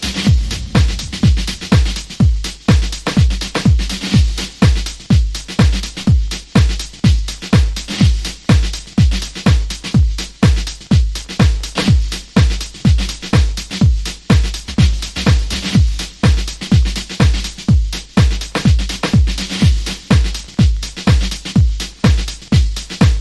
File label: WAR DRUMS